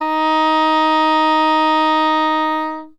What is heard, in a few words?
oboe; Winds